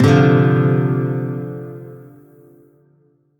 Shawzin Maj Chord D; Music; Warframe; Lotus Shawzin

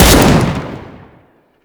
Rifle Shot; Kuva Lich

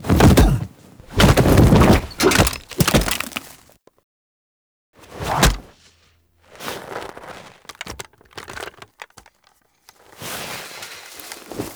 Foley; Cinematics; Grineer Eidolon

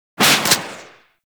Crossbow Shot, Weapons; Warframe; Tenno; Garuda

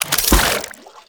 Harvester Item Pickup; Gameplay; Warframe; Venus Open World

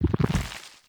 Nunchuck Pulse; Warframe; Infested

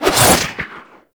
Board Sword Throw; Warframe; Weapons; Sundial

